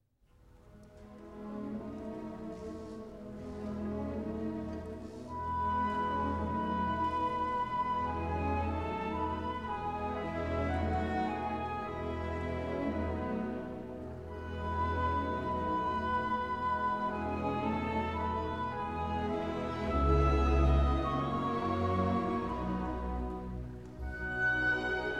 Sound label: Berceuse (Arr. For Orchestra); Dolly Suite; Op. 56 No. 1